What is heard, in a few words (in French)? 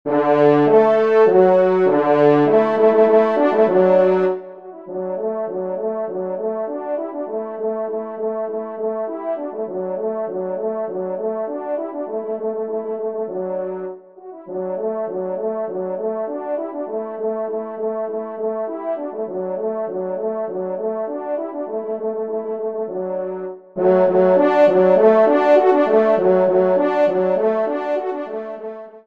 trompe; Le Veneur